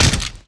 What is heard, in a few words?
Death Hit; Civ4 warlords